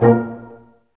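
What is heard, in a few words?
BRS, Brass Ensemble stac; Roland L-CD702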